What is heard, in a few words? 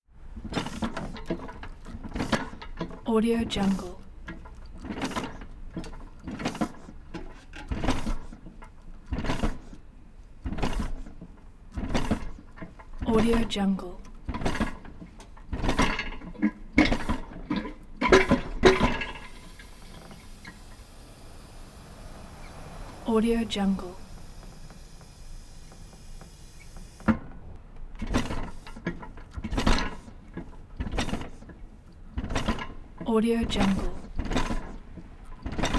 bed pumped up pneumatic release; prosoundfx